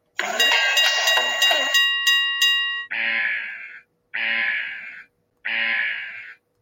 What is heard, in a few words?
word machine sound